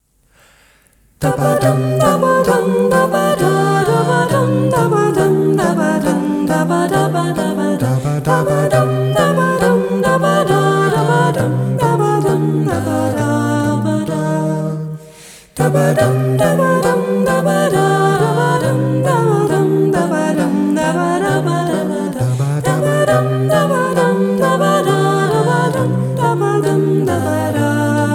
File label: Vocal Quartet; Black Lamp subtune 3